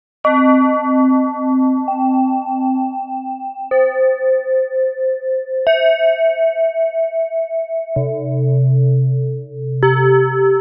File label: Good Chimes, Zen Tones; ringtones